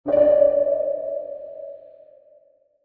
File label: Sonar Ping; Command